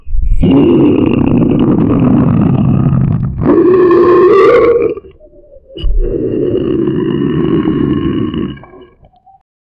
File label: extreme obscene gurgling